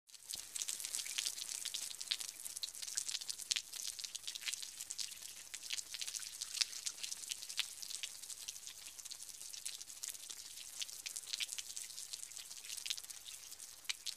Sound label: Light Water Spray With Splats Against Hard Surface